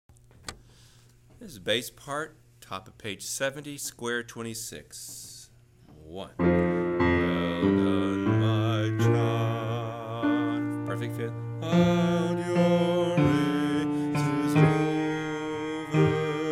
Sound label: Bass; Well Done